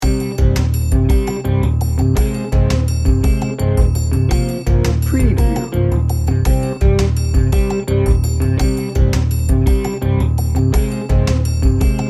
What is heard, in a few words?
swampy tension loop